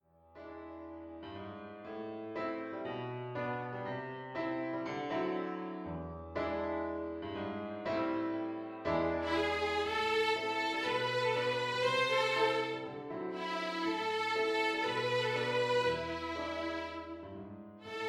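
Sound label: violin duet with piano accompaniment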